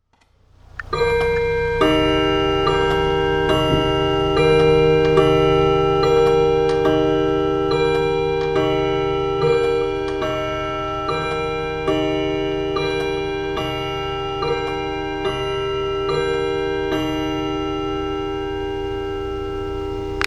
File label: Windup Bim Bam